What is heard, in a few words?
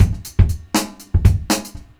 70s breakbeats loop; USB Soundscan vol.46; AKAI